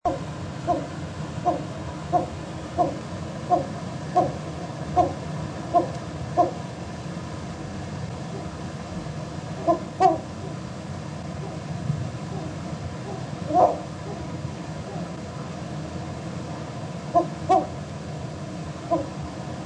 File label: Bulwers Petrel